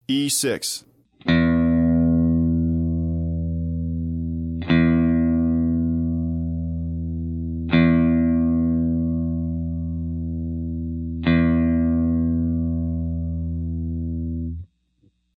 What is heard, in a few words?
Low E string, guitar